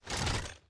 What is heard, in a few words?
Inspect, weapons; odin